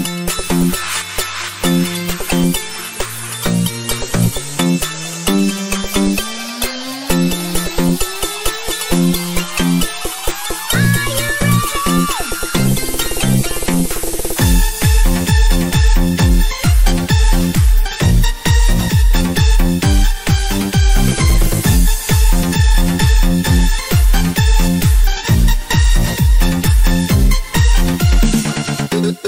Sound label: christmas night remix